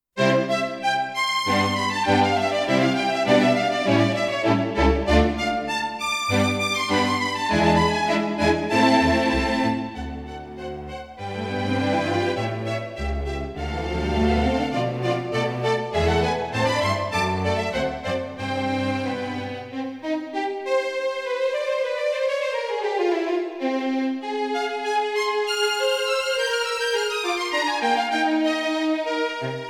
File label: Rondo, electone; Dragon Quest on Electone